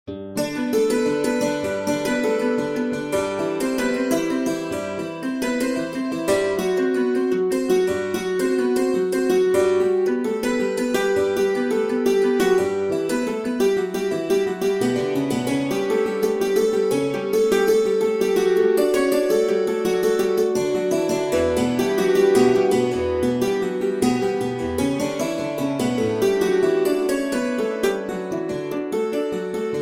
Cello Suite 1 in G Prelude

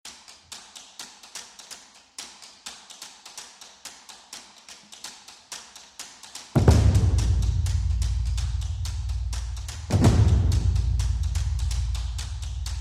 Drums, Circle Percussion; Drums in The Sun-Ring

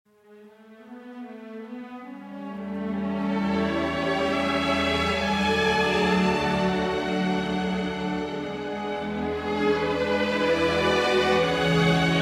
Symphony in E Minor Adagio; Symphony No. 2; Op. 27

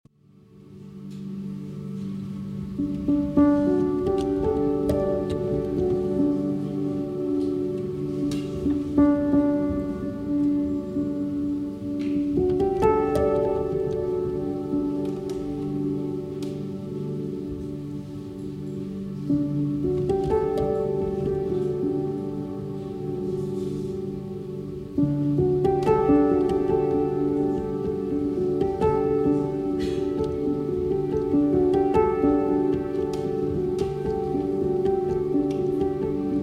Chimes, Live; Denis Stelmakh

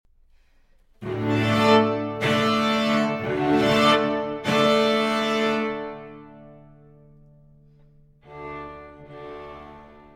String Quartet; III. 1934

